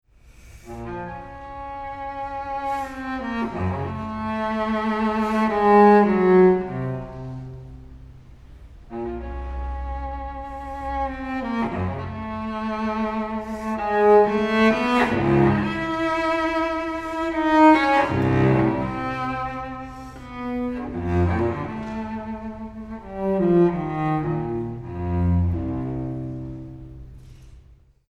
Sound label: chaconne